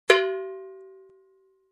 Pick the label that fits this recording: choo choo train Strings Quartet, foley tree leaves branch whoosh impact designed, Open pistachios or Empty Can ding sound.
Empty Can ding sound